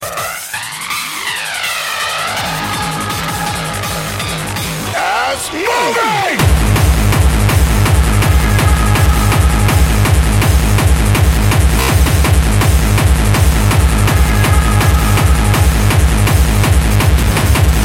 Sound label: Breath Italian Ringtone